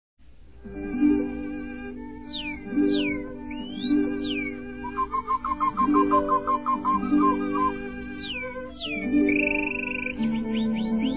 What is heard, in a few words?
BIRDS, Spring